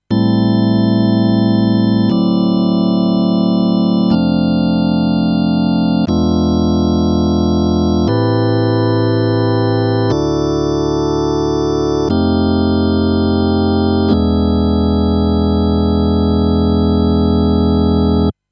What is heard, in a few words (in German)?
E Organ trocken